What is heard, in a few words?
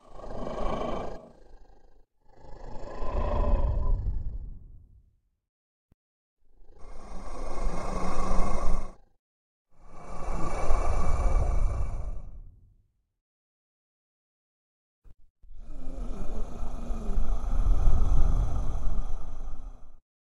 breathe